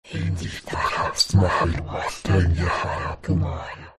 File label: Druid spellcasting